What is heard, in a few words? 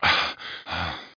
get health; heretic ii demo; player